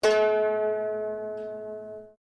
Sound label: HAMMER DULCIMER STRIKE MULTI MF, Old Chinese Hammer Dulcimer; Strings (Sound Effects:Musical)